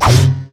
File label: crit attack; masteryi skin02